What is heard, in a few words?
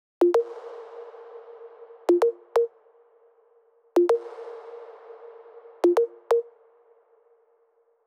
128BPM Seq Root A#, Tech House; Vengeance Samples; VDE1 Bonus TechHouse Kits; Titano Kit